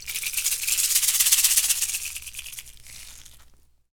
shaker, shaker FX; Roland LCDP14 Africa VOL-2; Perc FX

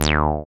SYN 303, Roland TB-303

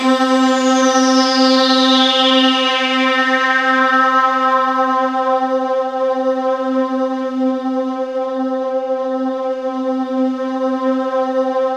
ETHERAL-S, KURZWEIL K2000

